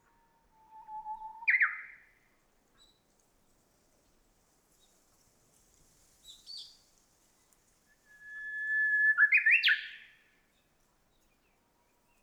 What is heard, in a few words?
Japanese nightingale